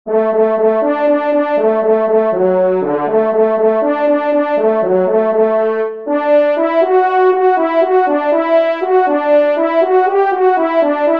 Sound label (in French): Lievre Solo, trompes; Fanfares imposables en Concours